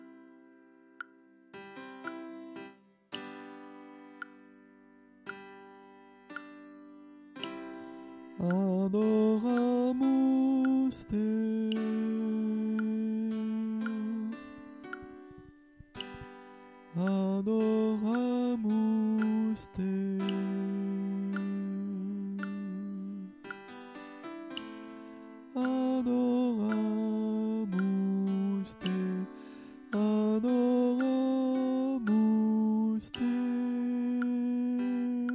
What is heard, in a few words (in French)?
homme; adoramus; chants